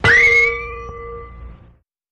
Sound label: Ding, metallic hit; chimes & bells